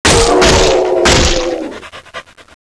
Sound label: barnacle chew; xash3d fwgs